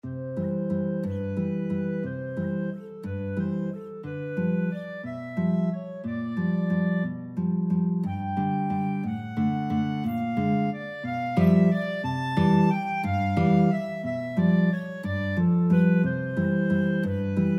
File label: waltz, guitar clarinet duet; sleeping beauty